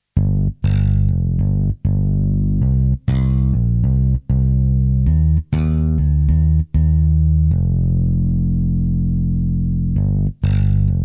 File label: Bass Comp; Golden Age Project, COMP-54